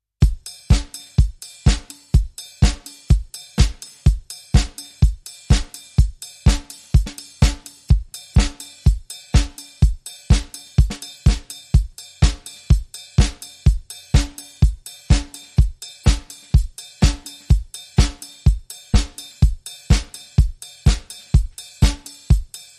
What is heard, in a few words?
Dance Night Bass